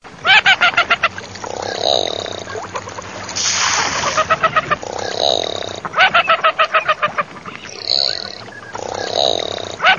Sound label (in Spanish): PATO CAPPUCCINO